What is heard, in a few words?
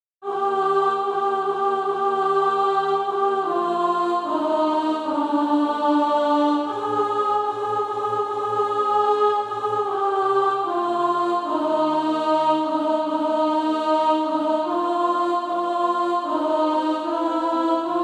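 alto, Practise Music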